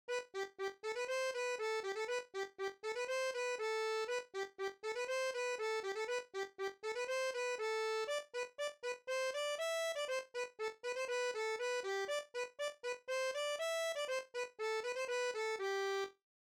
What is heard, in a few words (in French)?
congo simple sol